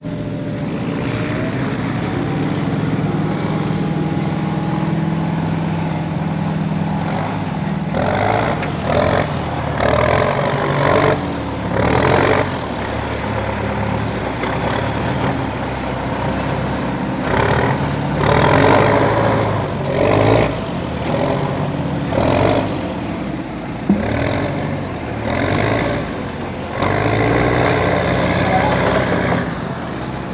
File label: industrial vehicle bulldozer; sound effects one